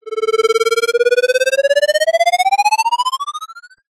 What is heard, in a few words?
cartoon ascend long